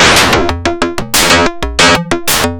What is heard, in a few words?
FM Perc Loop, Analog Glitch; Polyend; Stazma & Concrete Collage